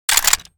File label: ch back, m249; weapons; Urban-Coalition; Anarchy; arccw ue